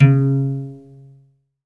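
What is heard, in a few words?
SOLO CELLO, Synclavier Strings; ILIO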